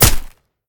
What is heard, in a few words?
swing hit; smite; tiamat